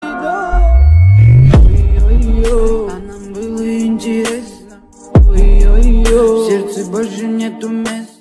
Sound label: remix